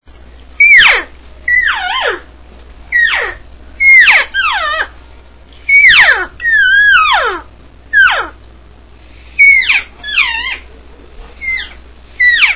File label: cow, elk; elk 2001